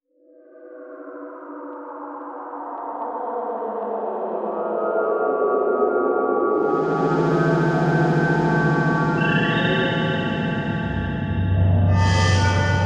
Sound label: Electronic Whoosh Reverse Feedback Digital; Module Production Element Title Imaging; Imaging Elements